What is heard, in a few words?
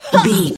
voice female hurt pain low